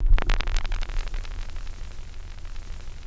nse, vinyl noise; house; PS-28 Vocal House Samples

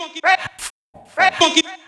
House Loops, Vocal Loops House 128BPM

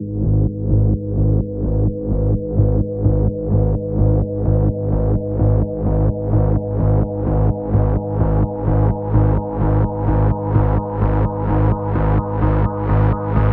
128BPM Sample chords 16Bars G#, Melody Fills; Vengeance Samples

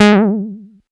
Bass Juno G#; Vengeance Samples

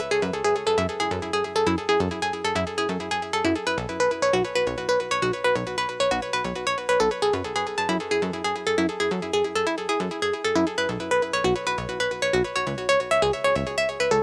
135BPM Sequence WET, F# minor; Melody & Synth

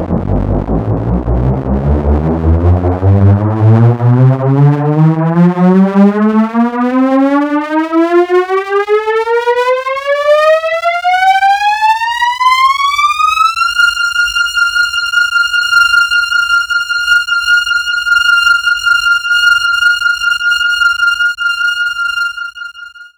Sweeps Long

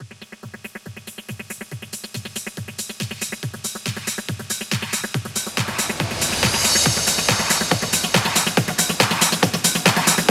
140BPM 8Bars, HandsUp & Trance Fills; Protect; Vengeance Samples

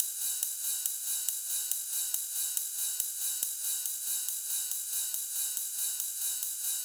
140BPM Genetic Kit

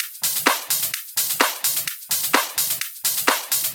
Minimal Loops

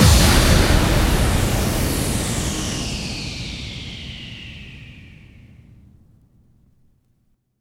Bassdrums FX, FX Kicks; Vengeance Samples

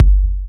Bassdrum; Vengeance Samples

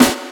snares; Vengeance Samples

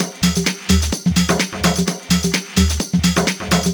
Latin House Loop 128 BPM; Vengeance Samples

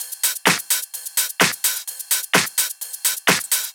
House loops 128BPM; Vengeance Samples

